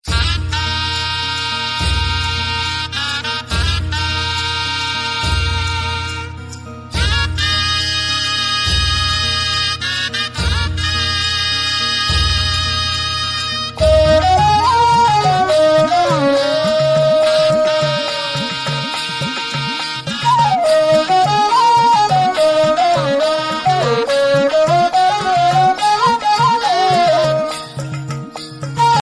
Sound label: marathi tone